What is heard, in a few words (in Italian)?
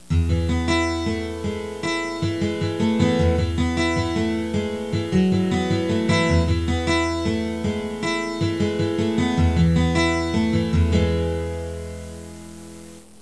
musica newage